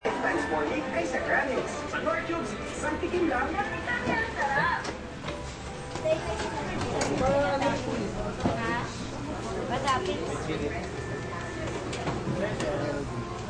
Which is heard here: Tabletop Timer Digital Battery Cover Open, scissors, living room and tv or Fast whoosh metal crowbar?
living room and tv